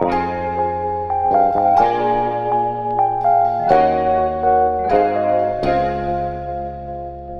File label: keys and guitar; loops; Phonky